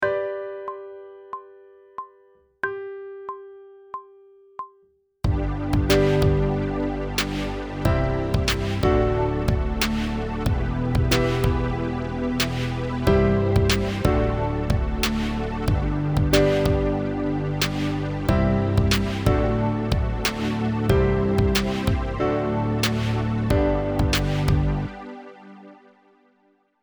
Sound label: Sight Singing backing track, pop vocals; Grade 4